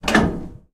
turret klank, tanks

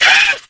dragon hurt

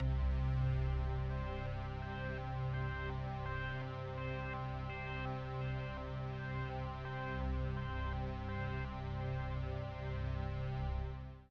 music interactive; World of Tanks

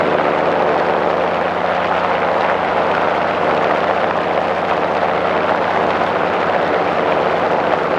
engine plane far; War Thunder

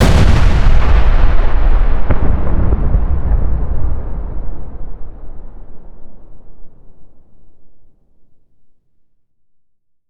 explosion large distant; Explosions

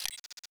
chat incoming friend request; Escape from Tarkov

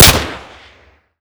usp45; Modern Warfare

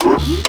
biomech jump mechanism

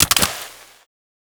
kick gun gun sand

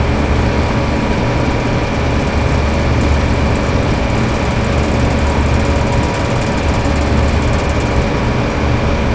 industrial fan med

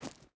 footstep boots gravel jump start; Rust

